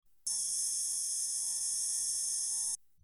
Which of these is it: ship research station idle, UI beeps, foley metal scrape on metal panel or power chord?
UI beeps